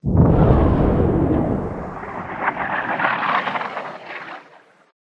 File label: Meteor Sonic Boom